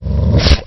jump; Fwwolf

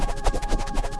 hammer spin; spells

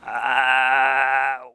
insane

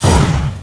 power

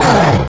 fall; opentdm; cyborg

